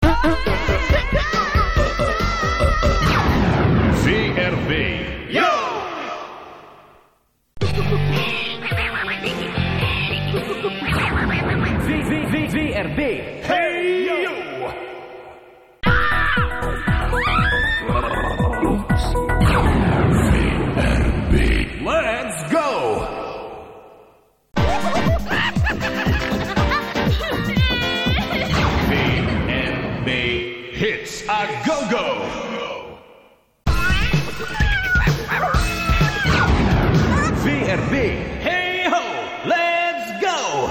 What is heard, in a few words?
jingles